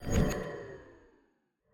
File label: environment mob despawn